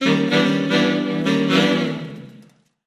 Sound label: Music Effect Jazz, jazz